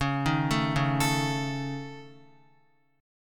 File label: Db Suspended 2nd Sharp 5th Db Guitar; arpeggiated; Dbsus2#5; JGuitar